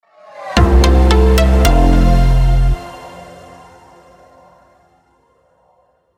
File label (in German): Soundlogo Juwelier; Wieser